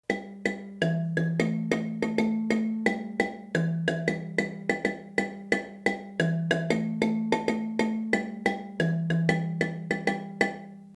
high line, marimba

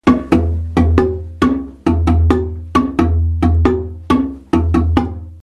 bass, marimba; chiradza

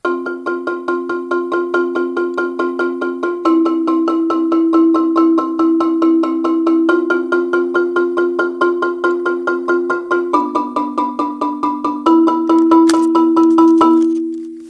c part, marimba

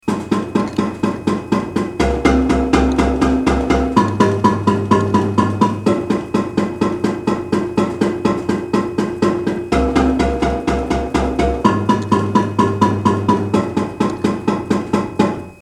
bass, marimba; carnival

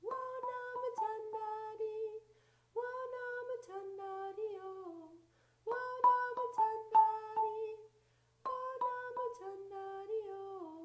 voice

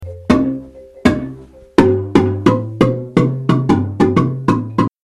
bass walkup, marimba

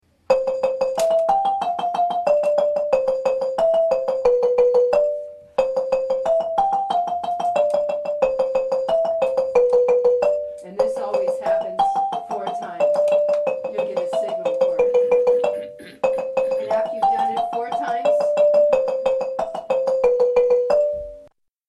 soprano duet, marimba; babamudiki

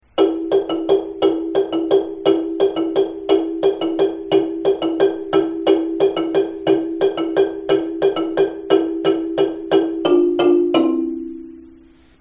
marimba, transition

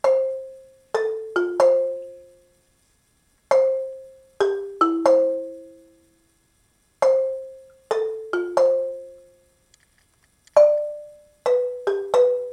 s2 c part, marimba

